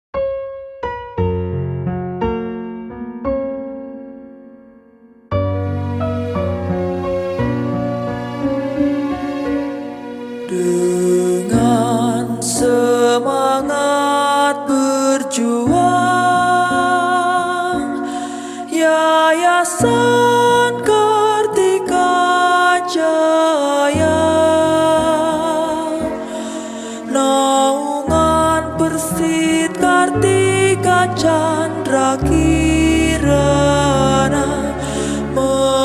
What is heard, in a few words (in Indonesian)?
Hymne; Yayasan Kartika Jaya Persit Kopassus